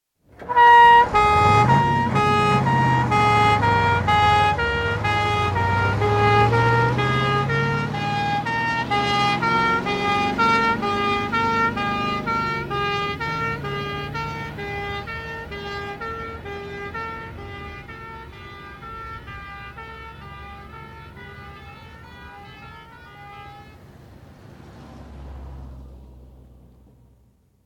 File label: Fire engine departs with two-tone horns, urban acoustic with reflections from buildings; Emergency Vehicle; BBC